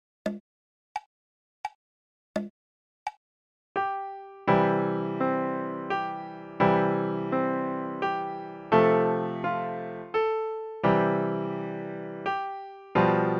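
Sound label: Good Morning In C Slow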